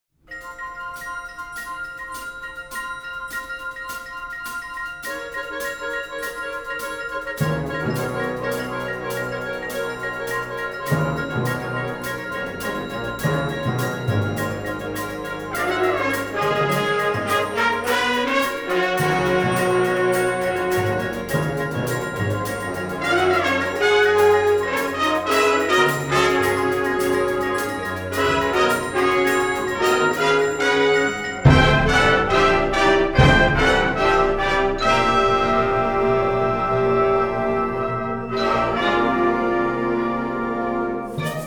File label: bandancing city shuffle